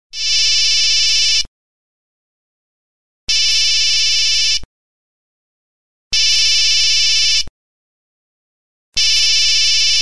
Someone is Calling Ringtone

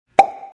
pop

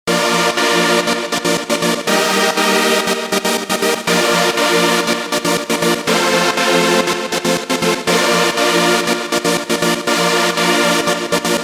positive synth ringtone

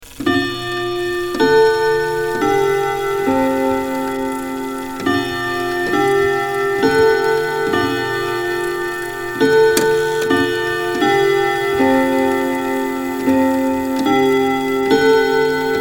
grandfather clock chimes

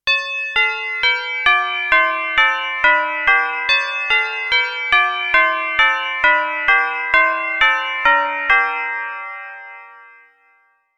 tubular bell

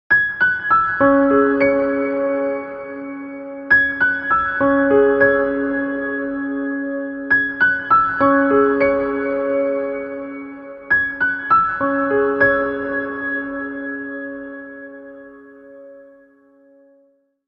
gentle morning piano ringtone

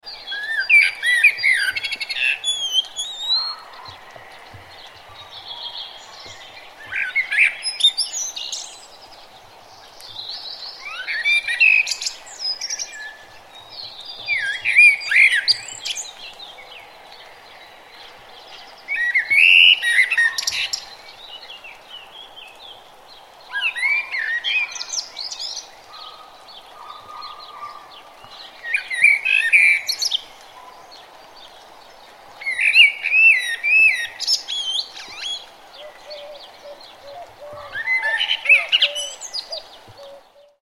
birds chirping ringtone

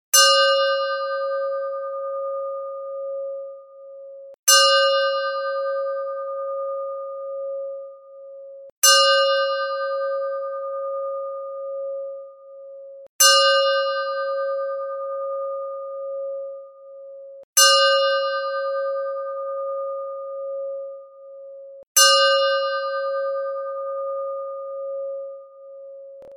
ding morning alarm

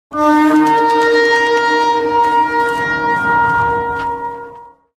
war horn

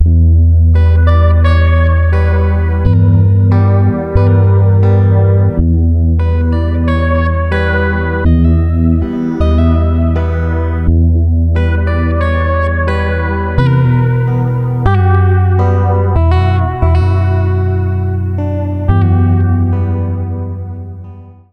sad nights ringtone

